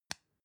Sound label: lipstick close soft; haus-garten; badezimmer